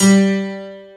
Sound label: PNO, PIANOS KEYS; Pro-Rec; Synthsonic